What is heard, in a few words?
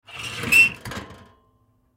Fireplace Door, Fireplace Door Close Squeak; Household; Fireplaces